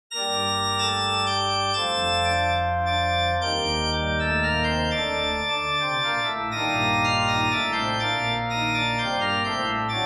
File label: Church Organ F, Minuetto in g minor, reverberated; Andreas Instrument Sounds AB